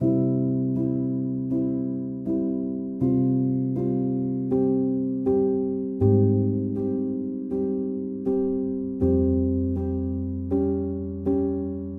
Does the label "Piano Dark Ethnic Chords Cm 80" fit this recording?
Yes